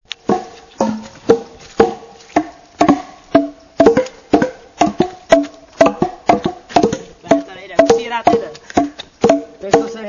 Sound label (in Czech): bubnovani